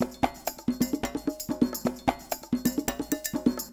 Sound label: Loop Percussion 130